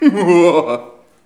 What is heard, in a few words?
mouhahaha